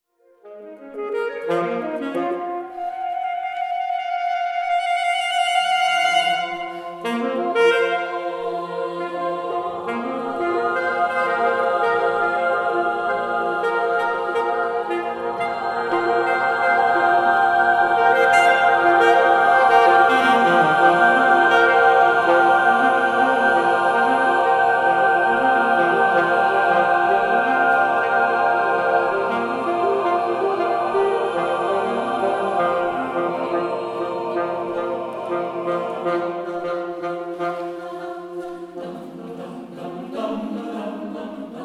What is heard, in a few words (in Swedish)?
Ungefär 4 improvisationer